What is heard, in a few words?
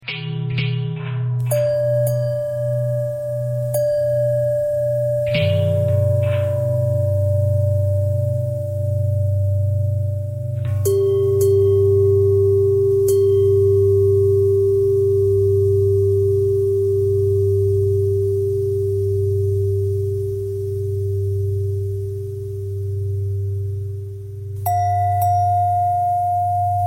long bell bend threads; bells